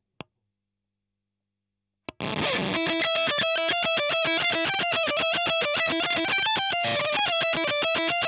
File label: EL Fuzz End